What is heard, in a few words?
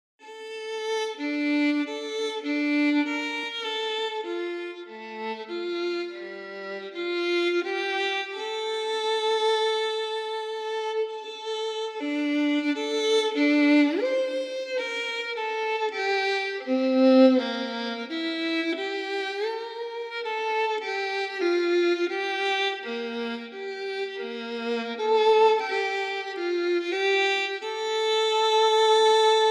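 violin; Embertone Friedlander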